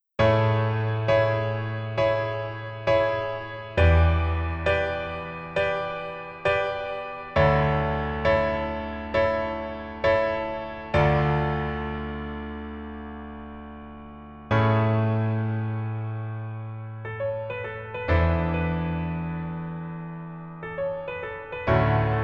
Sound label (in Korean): Piano ver 멜로디MR 여자키